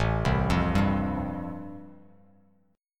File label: A-Minor 7th Sharp 5th, Bass Standard, chord; Am7#5 arpeggiated; JGuitar